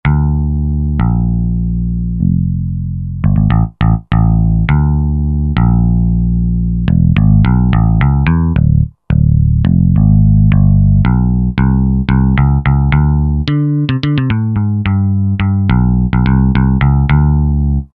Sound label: Pick Bass, Roland JV-2080